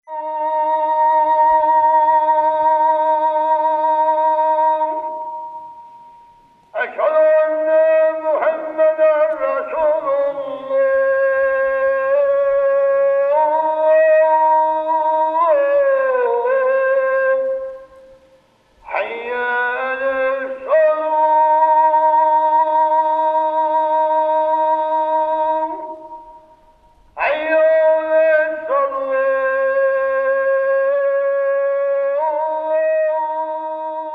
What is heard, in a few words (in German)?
Kashgar Muezin